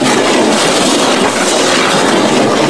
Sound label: Box Jib; XBOX; Amped 2